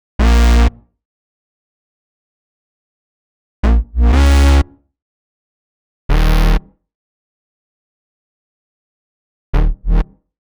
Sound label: 122 D#m Bass, Complex Electro Synths; Prime Loops; Invader